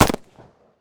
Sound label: rifle shot